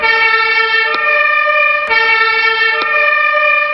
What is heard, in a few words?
iveco, szirena